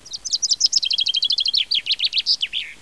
pinson des arbres, chant

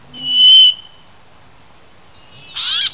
pinson du nord Fringilla montifringilla

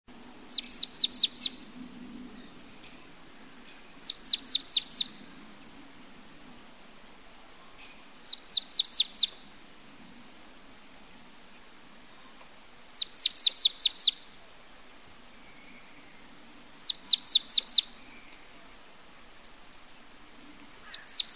Mesange nonnette, chant

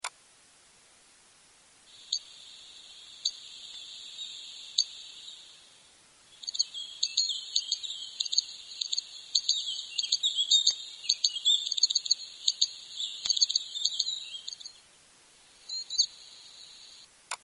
Bergeronnette des ruisseaux, chant

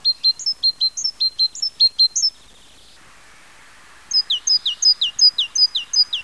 mesange charbonniere, chant

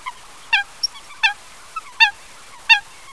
foulque macroule, chant

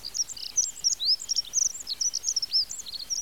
accenteur mouchet, chant

